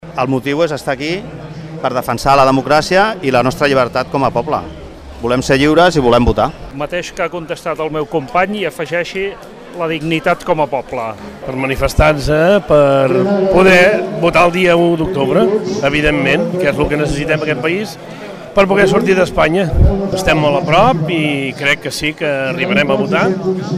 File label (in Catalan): BATERIA VEUS